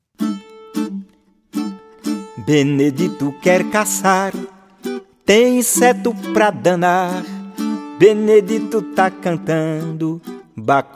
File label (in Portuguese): Vinheta